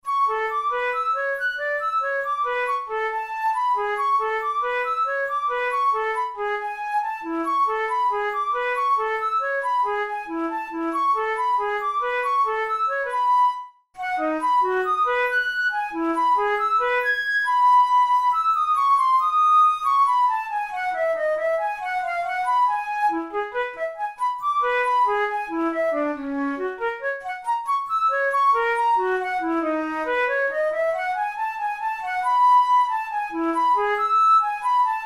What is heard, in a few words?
studies for the boehm flute; drouet 72